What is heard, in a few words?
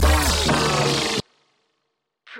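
Scratch Slide 100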